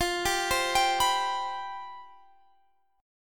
C Major 7th Suspended 4th F

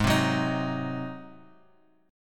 G sharp 7th G sharp; G#7 Chord {4 3 4 X 4 X} strummed

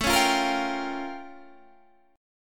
A Augmented 7th Flat 9th A; A+7b9 strummed; 1,3,sharp5,b7,b9

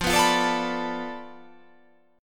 F 6th Flat 5th F down, chord

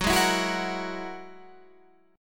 G sharp 7th Suspended 2nd Sharp 5th; chord shape; 14,11,14,11,11,14

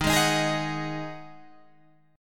Eb Minor Double Flat 5th Eb Guitar Open G

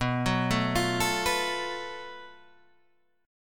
F sharp Minor Major 7th Double Flat 5th, guitar

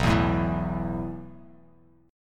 B 7th Suspended 4th B down; B7sus4; strummed; JGuitar

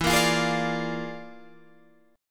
E 7th Flat 5th Flat 9th; E7b5 B9; strummed

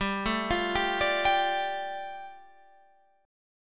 G 7th Suspended 2nd, Guitar Standard; arpeggiated; JGuitar

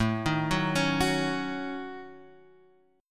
A 6th Flat 5th, Guitar, Open F; A6b5; arpeggiated; JGuitar

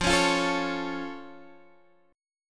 A Augmented F, chord shape

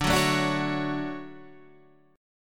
D Major 7th, chord; DM7 strummed

